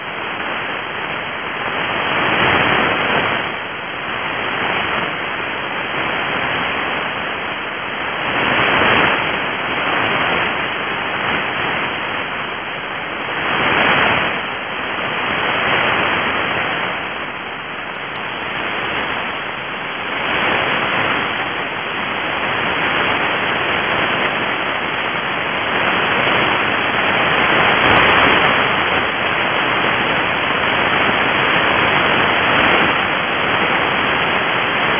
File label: jupiter long bursts